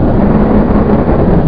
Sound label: HYDRO; Duke Nukem 3D Kill-A-Ton Collection